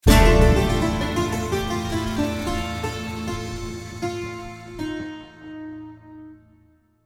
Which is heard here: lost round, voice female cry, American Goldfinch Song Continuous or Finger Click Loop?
lost round